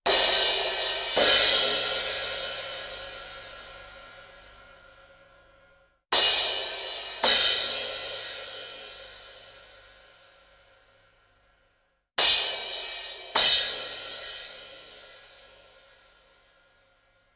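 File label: cymbals, tube; lewitt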